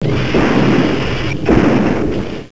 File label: death; alienarena; war